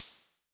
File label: Hi Hats Open; LIMAT Div Dept